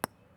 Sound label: concrete, ball